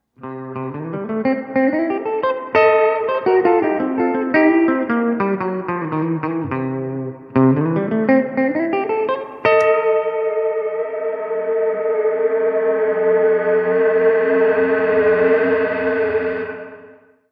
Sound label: lo fi; ventris